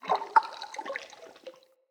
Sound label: cavernous water lap rock; Ambience; Under City